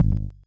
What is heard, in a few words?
Perc, techno drum